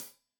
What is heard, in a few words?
Hat Tight, Hi-Hat, Cymbals; Deeply Sampled Drums